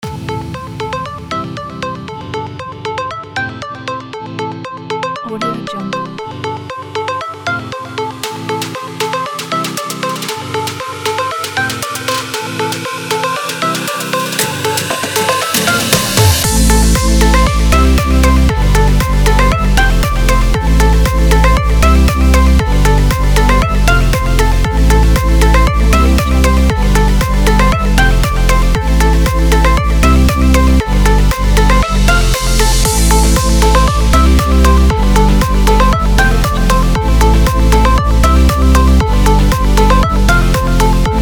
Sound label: upbeat edm pop